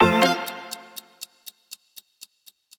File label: Challenge Activation